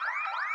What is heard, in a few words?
alarm loop; teardown ps5 windows xbox series xs gamerip 2022